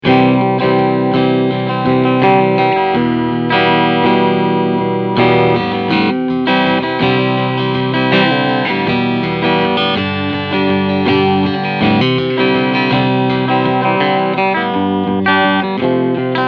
Cleansound

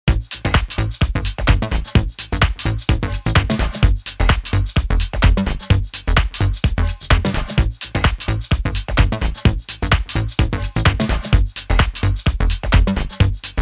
Deep Mojo

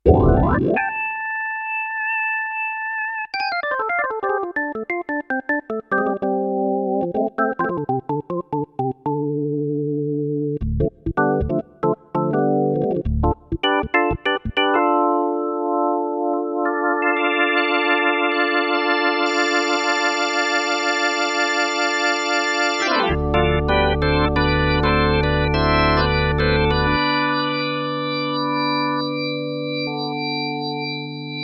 Drawbar

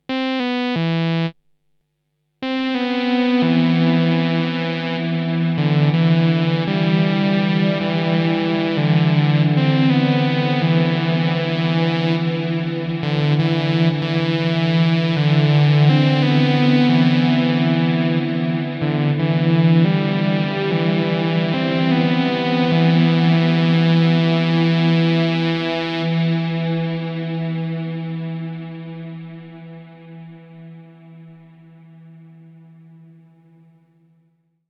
Synth Mercury X Cathedral

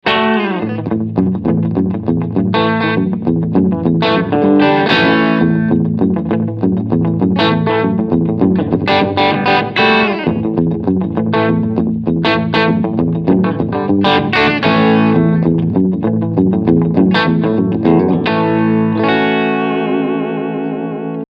Echo

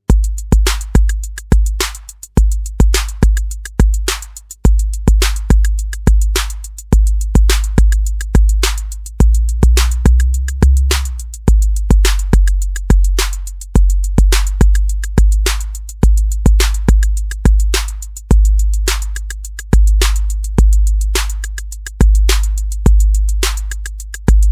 bassdrum; drumatix

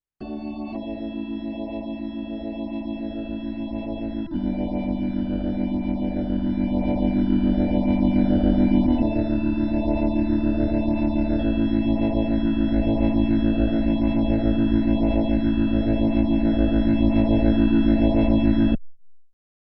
B4000 4 Driver

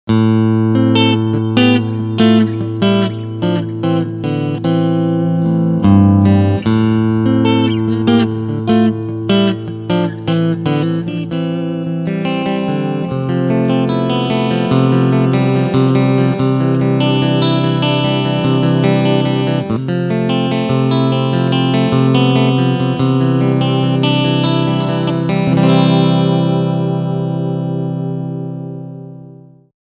Clean

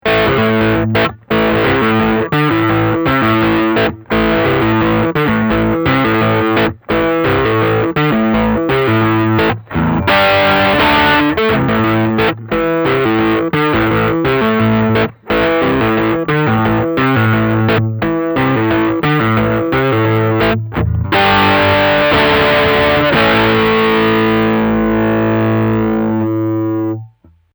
Crunch

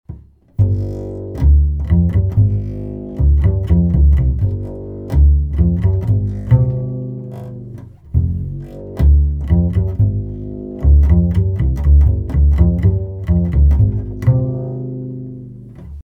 Upright bass close; sanken CU-44x Mk2

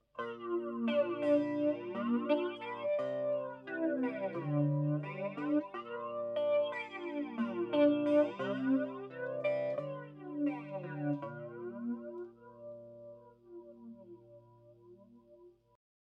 Pyramids through zero

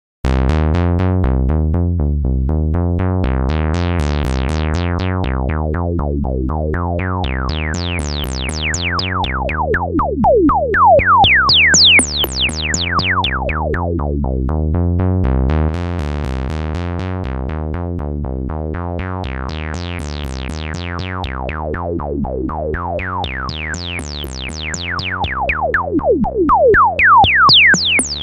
filter

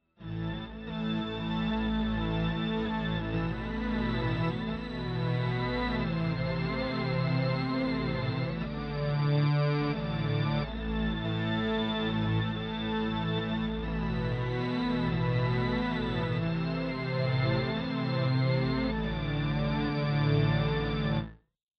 Heavy Pad

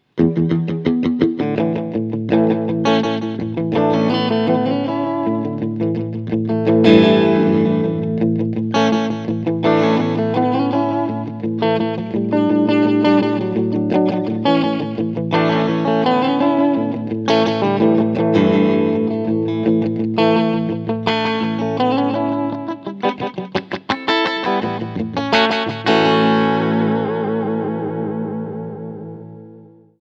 Short Delay

